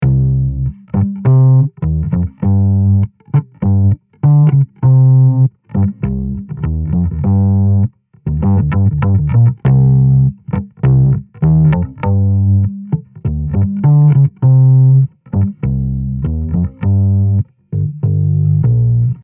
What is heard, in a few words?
bass; Μπάσο